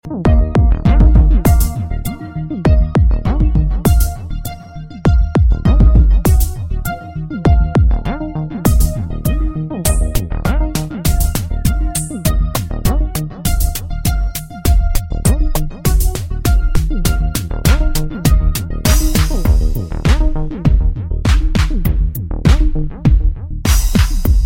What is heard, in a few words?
iphone4s bass; ringtones; sms